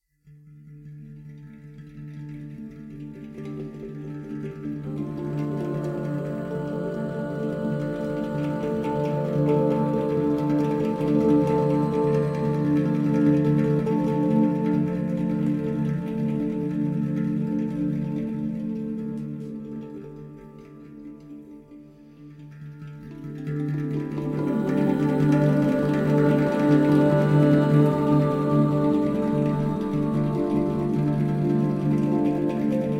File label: handpan